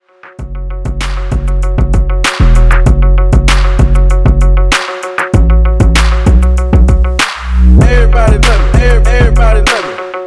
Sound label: Blend; remixplanet